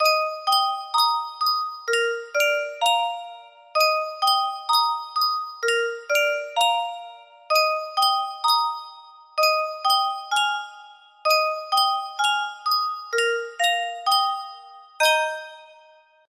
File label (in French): boite a musique